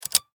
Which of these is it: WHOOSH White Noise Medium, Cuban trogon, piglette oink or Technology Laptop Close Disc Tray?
Technology Laptop Close Disc Tray